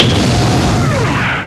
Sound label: Rocket Launch; Toy Story Mania